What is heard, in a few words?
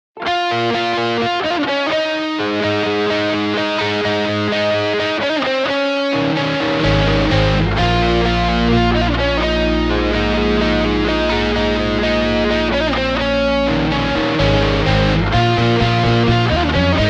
reamp FX bass